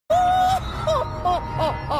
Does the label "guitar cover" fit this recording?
No